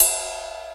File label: Ride, EMU Proteus-2000 drum machine